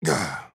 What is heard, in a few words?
Movement Land